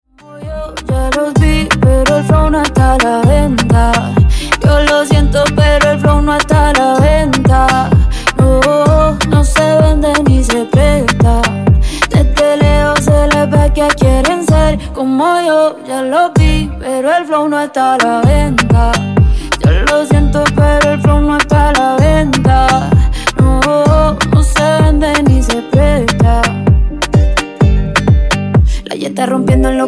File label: Dj Intro Outro 128Bpm